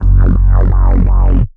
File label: pulsing phasing hard synth bass